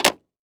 Technology Electronic Machine Fax Machine Hanging Up Phone Slam